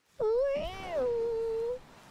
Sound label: Litleo impression